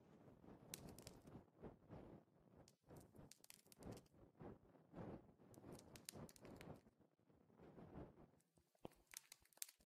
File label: Torch Details